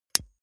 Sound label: lighter electric switch